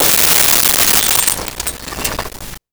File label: Glass Shatter Light; Impacts; SONY PICTURES SOUND EFFECTS SERIES